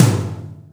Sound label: modern acoustic rock tom drum B key